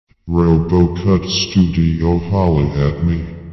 robo

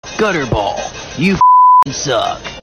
gutter ball

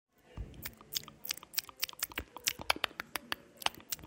asmr

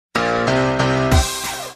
piano hm

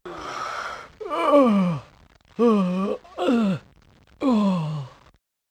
yawn

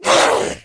attack; gnoll

